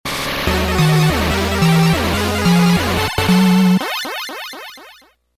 Suspense!; Scott Pilgrim vs. the World: The Game

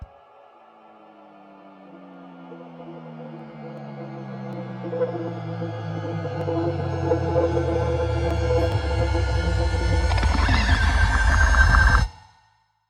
Wither riser; minecraft story mode gamerip 2016; Order of the Stone

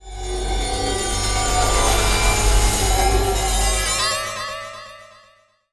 Round End; Moon; Call of Duty Zombies; Treyarch 2008

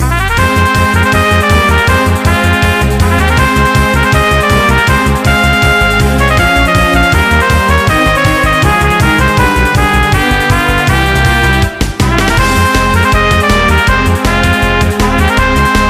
Battle Loop

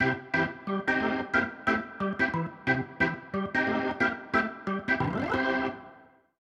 organ

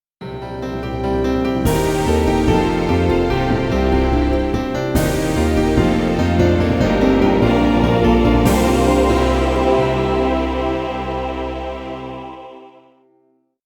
Win Jingle; Cosmic Stacker; windows

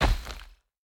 Moving Obstacle Stop Debris; boomerang fu

